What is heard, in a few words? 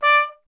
TP D; Miroslav Vitous - Solo Instruments VOL-1; Trumpet